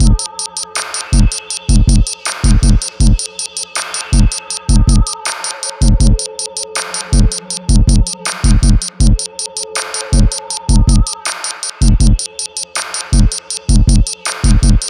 sine wave

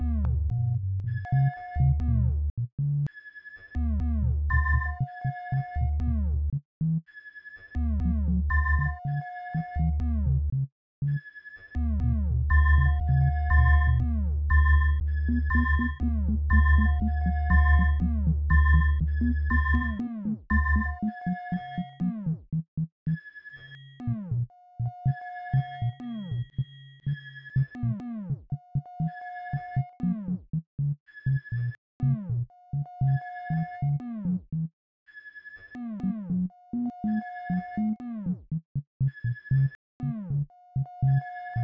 sine wave

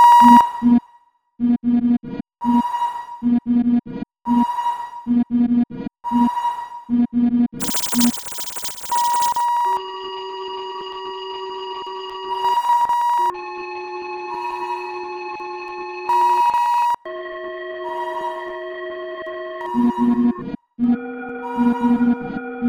Sine Wave

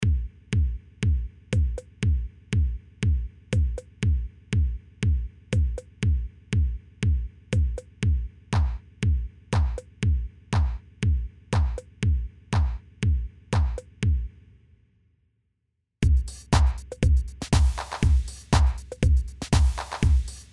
MIDI Test

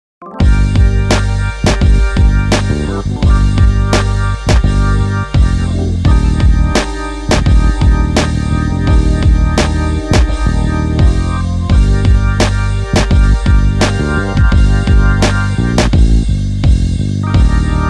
midi example

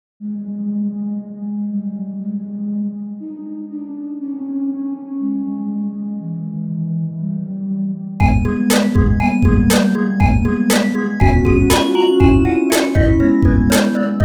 sign wave

